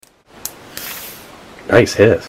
hiss

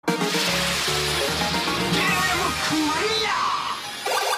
game clear

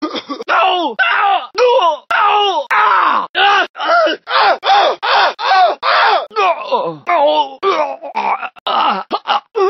pedestrian voices coughing pain male; gta san andreas

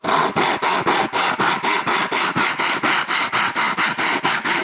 cutting tree limb

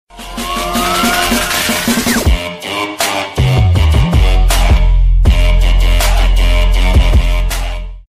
dubstep

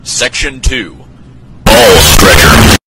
bass boosted

